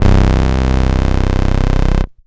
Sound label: Korg Poly 800 Saw Bass C3